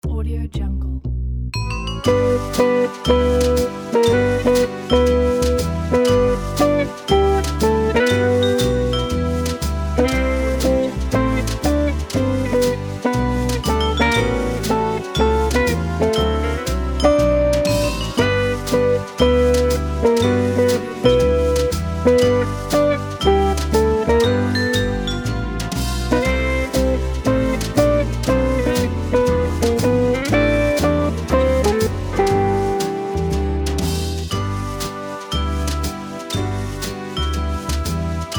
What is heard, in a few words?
nostalgic jingle bells